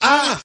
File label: "ah", Voice